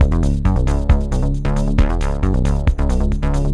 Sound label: minibass, MiniMoog, monosynths